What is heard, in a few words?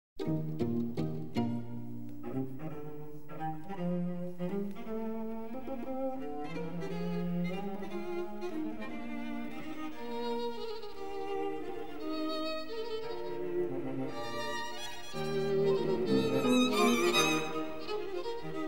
String Quartet in E flat